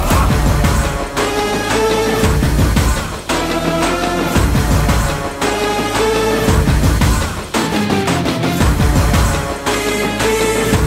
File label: mass bgm ringtone; etharkkum thunindhavan